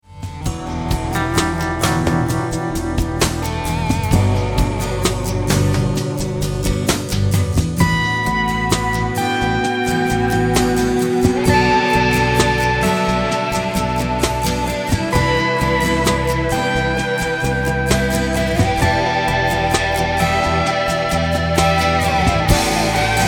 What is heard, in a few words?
Falling texture